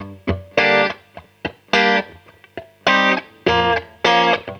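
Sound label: guitar, Roots Funk; USB Soundscan vol.41; AKAI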